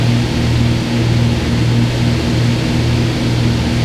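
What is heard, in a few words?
morava cockpit left, engines; War Thunder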